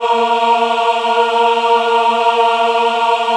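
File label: A#3 men women ah, Choir; USB Soundscan vol.28; Acoustic & Synth; AKAI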